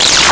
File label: Arcade Alarm Loop; Arcade and Games